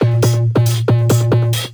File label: percussion loops 138; PS-45